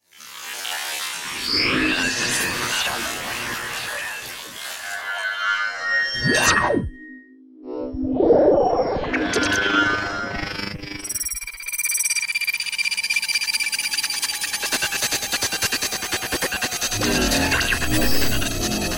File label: energy sound fx; big room sound